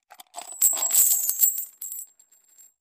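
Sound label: Cup Lrg Washers Dumped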